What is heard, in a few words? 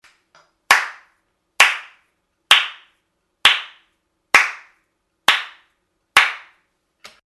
Hands Clapping